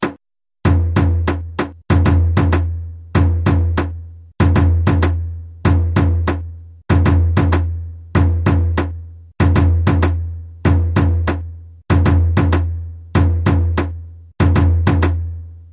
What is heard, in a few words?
surdo